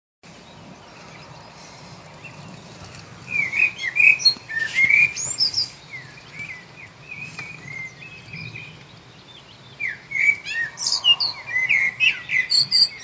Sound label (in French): chant d oiseaux